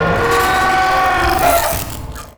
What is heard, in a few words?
wooshes bent metal; Whooshes